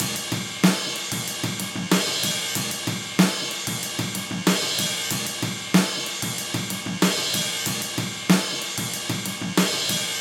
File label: 94 live dirty jazz, breaks, Live Breaks